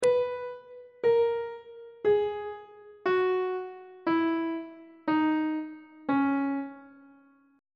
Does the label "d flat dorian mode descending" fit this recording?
Yes